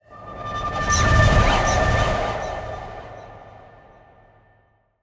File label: transition; bluezone; sonniss gdc